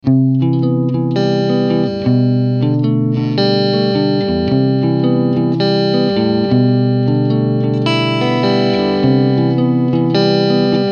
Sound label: orange th30c-bk clean